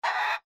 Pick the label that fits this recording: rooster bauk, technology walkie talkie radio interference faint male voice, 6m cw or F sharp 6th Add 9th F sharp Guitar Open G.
rooster bauk